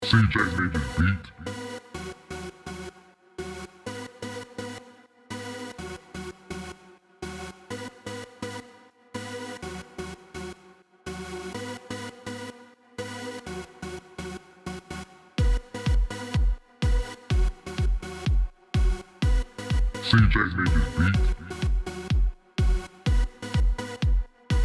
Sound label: Rave Sampler